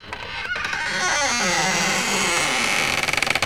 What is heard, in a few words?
DOOR creak; doorSounds